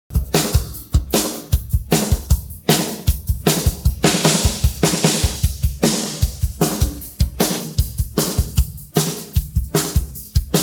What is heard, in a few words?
drums pattern